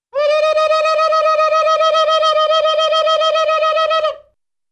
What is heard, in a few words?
Single Indian - War Whoops, Cartoon; Hollywood Edge; Cartoon Trax